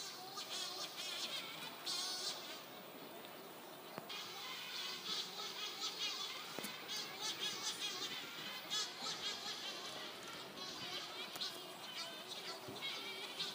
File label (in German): Gelbschnabel Sturmtaucher